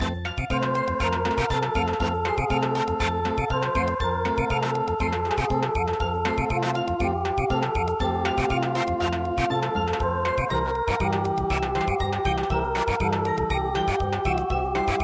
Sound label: Samba